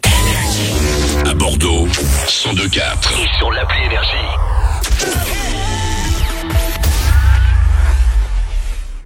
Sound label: JINGLE